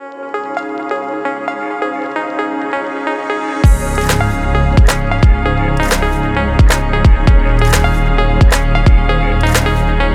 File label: background technology